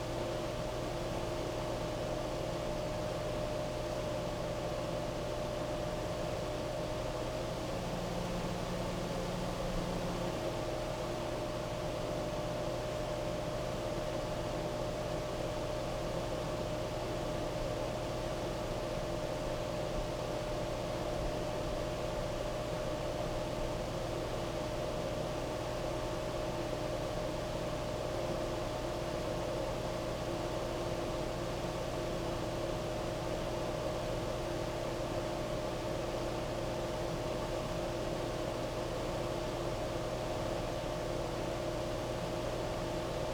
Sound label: Rangehood Fan, Interfering noise source; TS 103 504; ETSI